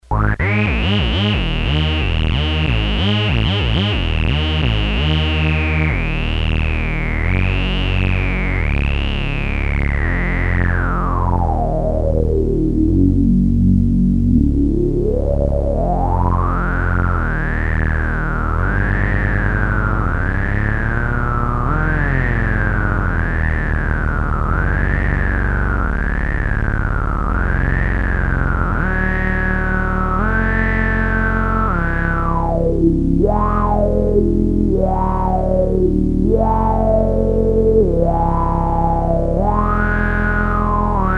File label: detune, synths paia